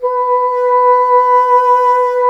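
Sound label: wind bassoon B; Miroslav Vitous; Solo Instruments VOL-1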